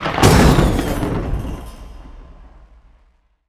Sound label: battle end open defeat; Iron Tides; macOS Windows gamerip 2017